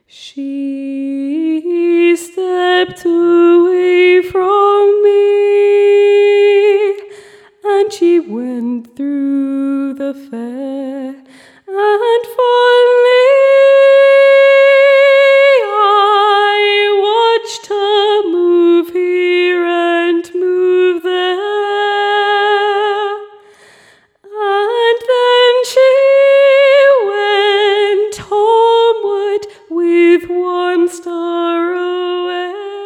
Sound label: Vocal Solo